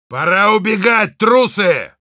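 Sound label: Heavy yell, Russian; «Пора убегать, трусы!»; Насмешки пулемётчика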